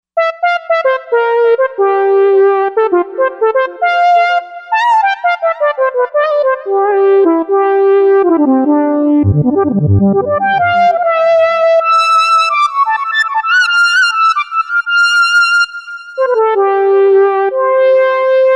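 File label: Lead Singleline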